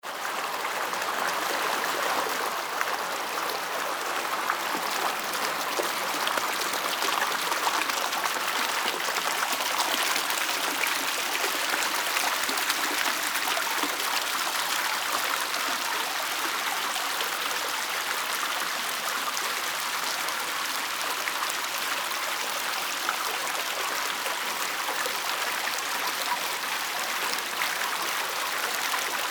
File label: stream small